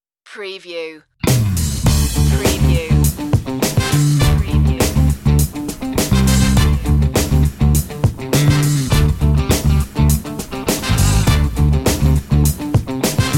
soul beat

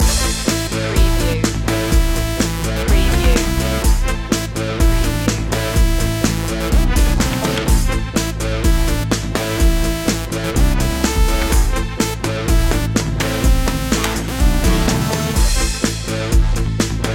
retro video game

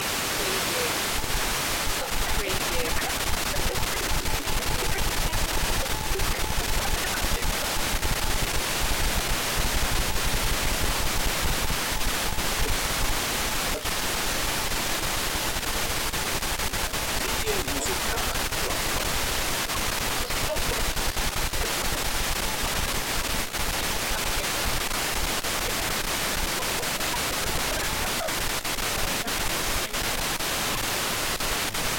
TV UHF Static and Snow; Quasar

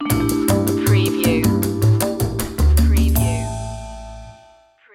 jazzy opener stinger

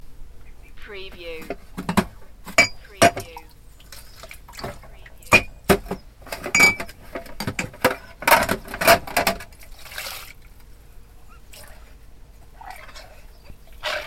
Washing up

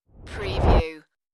Rush

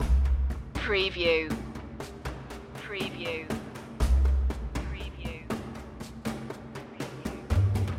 desert caravan loop

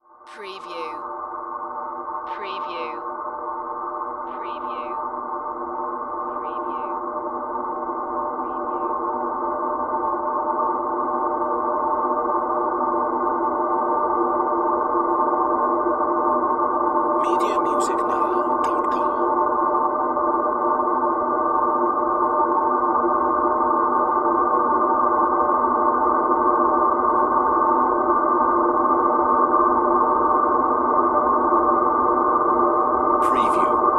Drone Airy Build; Sound Effects Bible

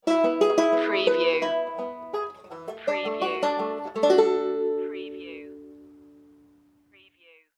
red fern grows stinger

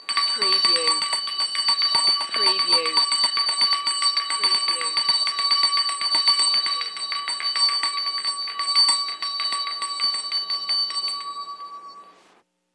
Small Hand Bell Vintage

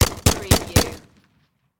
Machine Gun HK416

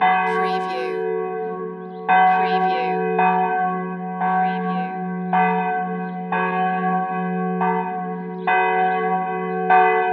Church Bell Continuous

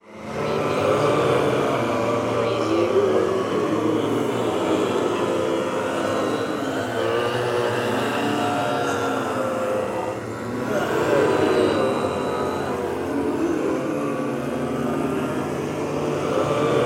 Monster Zombies Moan

